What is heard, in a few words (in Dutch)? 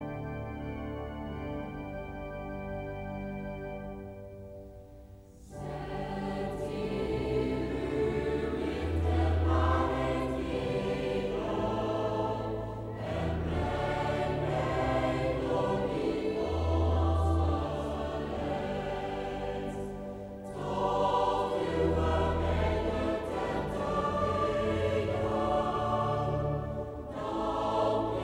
Koor; Psalm 43 vers 3,4,5